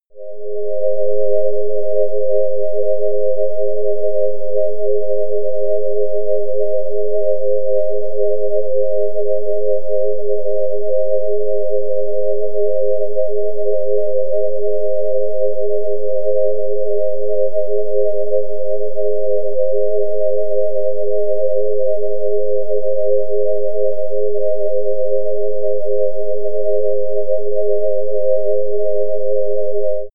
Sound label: air bell